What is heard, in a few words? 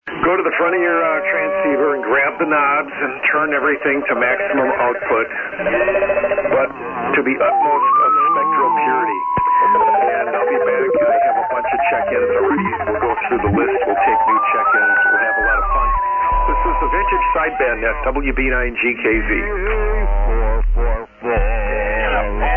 SSB Net Tune Up